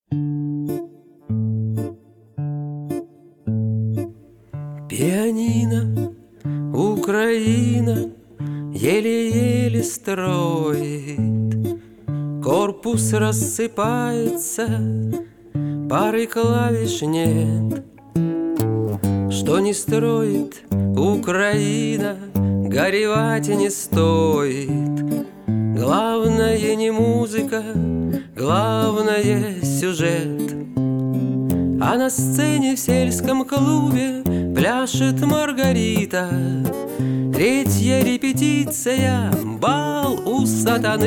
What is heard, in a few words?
pianino ukraina